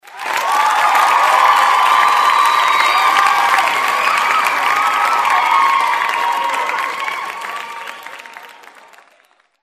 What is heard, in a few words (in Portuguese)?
efeitos aplausos